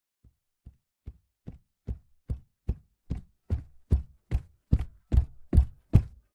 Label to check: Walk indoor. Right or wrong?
Right